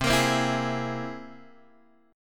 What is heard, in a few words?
C Augmented 7th, Guitar, Open G